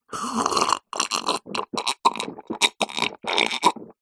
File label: human drink from glass slurp swallow